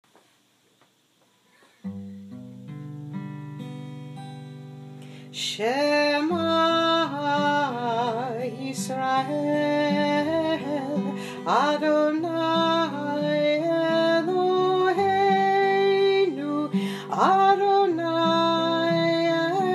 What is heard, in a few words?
Shemah MELODY; HHD